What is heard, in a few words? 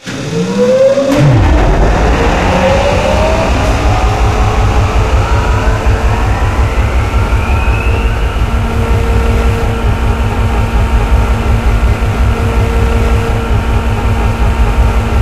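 gel pump start loop; Source Engine; Portal 2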